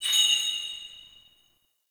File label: Town Door Bells; Ambience; Duviri; Warframe